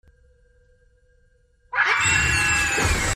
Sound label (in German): creep schrei